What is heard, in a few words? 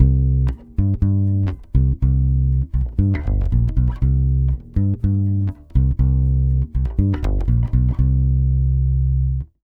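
120 BASS C minor, Brazil Bossa; USB Soundscan; AKAI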